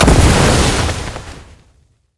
snowday qspellexplosion; LoL; ziggs snowday

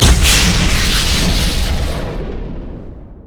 chaos storm; LoL; viktor

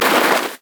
sad mummy bandage toss; LoL; amumu

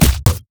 bear basic attack; LoL; annie base

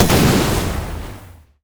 inferno bomb; LoL; ziggs poolparty

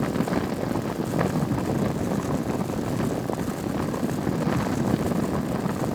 twin bite human; LoL; shyvana

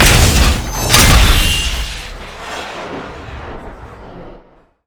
alter lock; LoL; altars

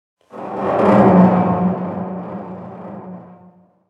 big chains groan

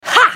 spell cast effort, en US; Caitlyn; Character Voices - English

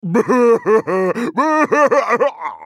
Maokai laugh

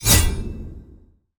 ui hud level up; LoL

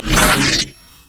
essence flux; LoL; cyberezreal

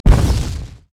tower; LoL

